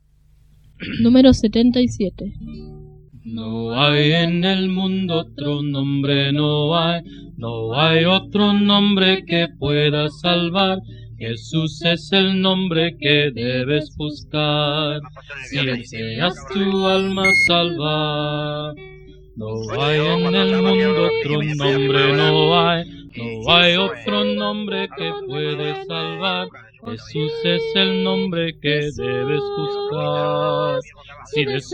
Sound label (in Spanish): Coro, himnario niños